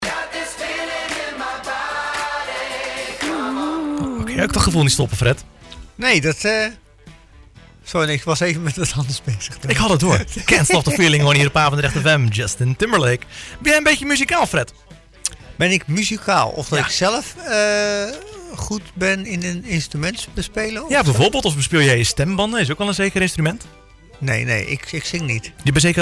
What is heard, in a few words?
band boost